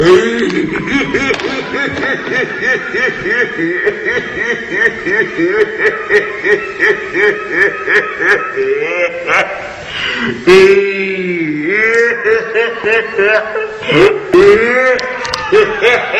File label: creepy baby